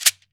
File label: MARACA; AKAI S-Series CD-ROM Sound Library VOL-5; SHAKER.MARAC